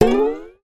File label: cartoon ascend strings slide classic; zapsplat